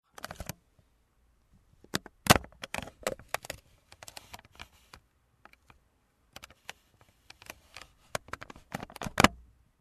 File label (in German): Öffnen und Schließen, Panasonic toot a loop